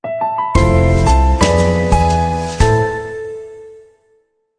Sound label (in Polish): jingiel jazz